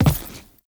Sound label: Crew Footstep Default; Warframe; Characters; Railjack Crew; Steel Meridian